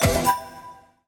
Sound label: spin Button Click; netent; eldorado